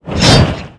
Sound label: ATTACK